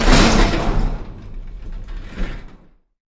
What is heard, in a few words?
crashing open elevator door; Sound FX Real Life